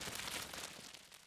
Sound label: putout; Panic Volcano; Trees